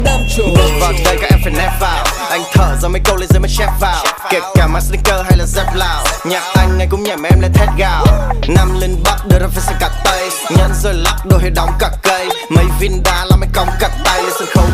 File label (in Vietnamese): Rap Viet; Mua 3